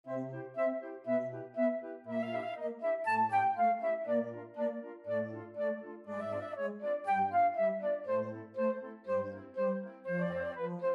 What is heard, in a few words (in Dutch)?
fluit; Finale; Carnaval des Animaux; Camille Saint-Saëns